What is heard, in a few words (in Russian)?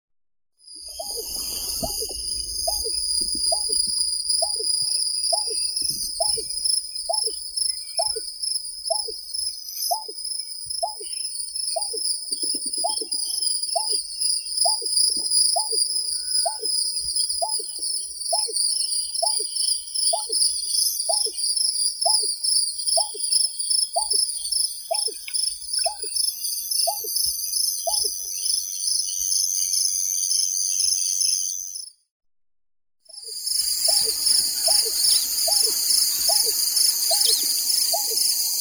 bekas tsvetnoi rostratula benghalensis